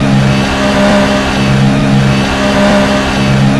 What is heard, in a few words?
in CMED; RedBull; AOS F1 2022